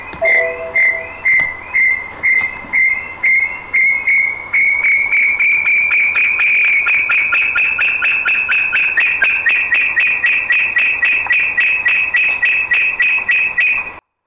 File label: Brown Tinamou